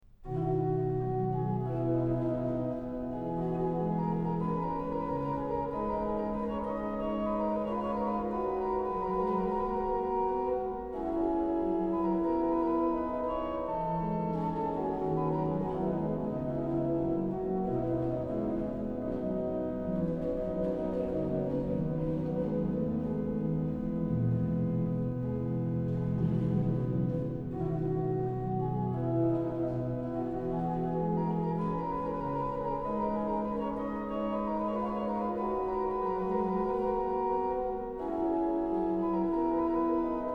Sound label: ORGAN, Chorale Preludes; BWV0728; Jesus, meine Zuversicht; BACH; BACH ORGAN WORKS